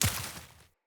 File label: Short Sword Impact Vegetation; Weapon Impacts; Enemy; Swords; Sinecure Short Sword